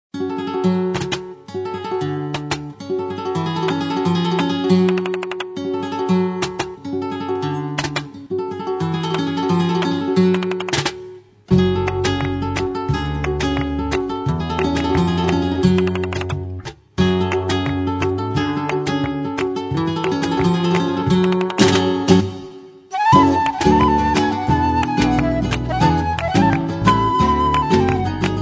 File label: otros caminos, gitarre flamenco